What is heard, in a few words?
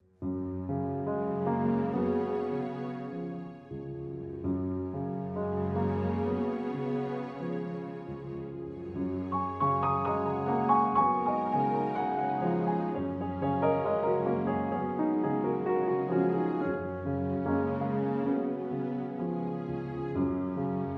With Strings